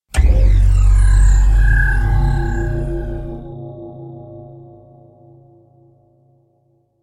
portal open; A succubus is born

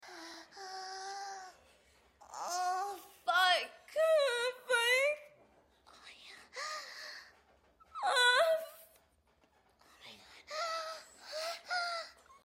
moan

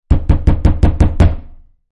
door knock; A succubus is born; langley

